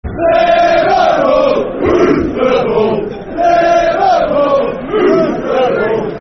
Liverpool Hoof The Ball Football Chant (Soccer Song)